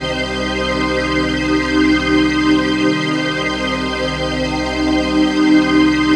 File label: PHASE PAD, ATMO PADS; Best Service; XX Large